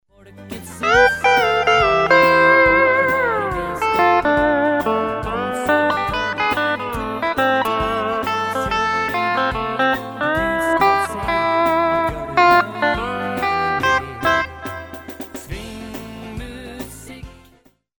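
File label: swing steel, steel guitar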